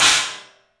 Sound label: CYMBALS; 3D Sound; 3D Drums